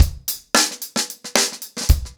Hip Hop 110BPM, acoustic drums drumloops

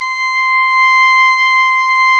OBOE; Sound & Vision - Gigapack I CD 2 (Roland); Brass&Woods1

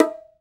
M BONGO, World Percussion

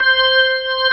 ORG, Organs

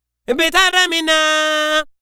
African Harmony Vocal; Continental Drift Aftershocks; Vocals

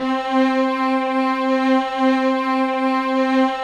STR C3

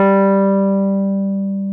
PNO Wurly; Roland LCDP10 Keys of the 60s and 70s 2; Wurly 200B

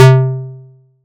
SY QK BASS; ModelCycles

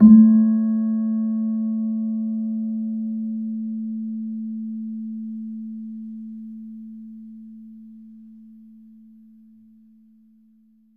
PELOG A1, PELOG BASS; Soundscan vol.60; AKAI

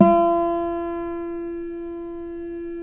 GUILD 12 F#4, 12-STR; Spectrasonics; Hans Zimmer Guitars VOL-1

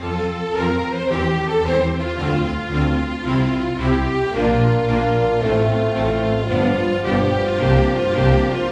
Orchestra, Rock Pop

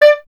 Clarinet D; Miroslav Vitous; Solo Instruments VOL-1

